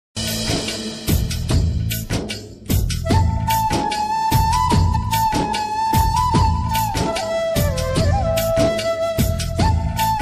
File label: love bgm kannada ringtone; bhoopathi movie; d boss